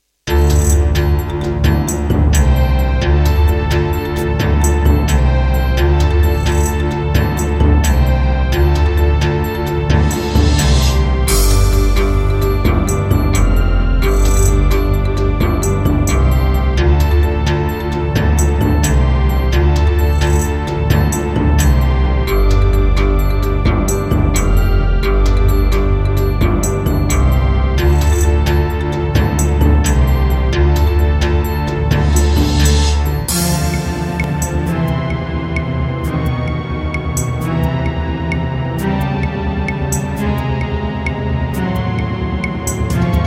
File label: MidiPlus MiniEngine USB, wavetable; Heretic